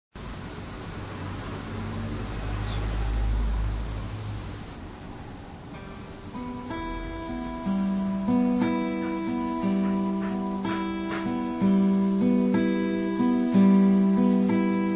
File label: bird guitar